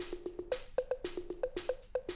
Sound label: Classic Drum Loops